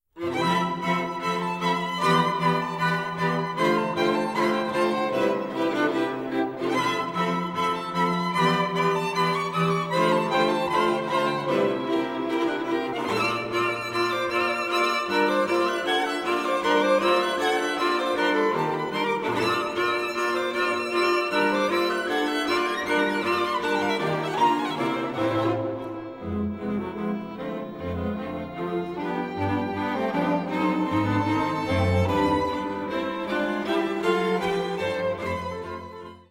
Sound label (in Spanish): Cuarteto; Cambra; Indiano IV